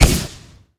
win Symbol Flash; fairyred

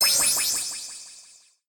Add 1 to free spin counter; koi princess

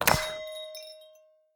spin Button Click; koi princess

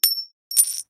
wild coin land; irish pot luck

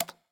button general, blackjack; blackjack3

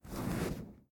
start screen sweep; koi princess

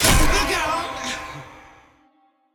scatter hit

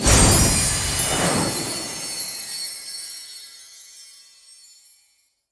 super Mega Win Text Change; fairyred

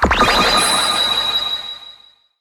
win Animation; koi princess

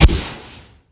win Symbol Flash; fairyred

